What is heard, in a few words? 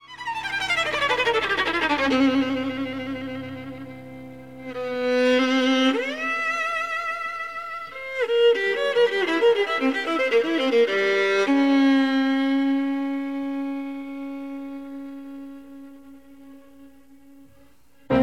Tango